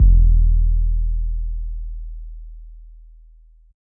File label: urbantrap kick; Hip Hop; Urban Trap Kit; style kits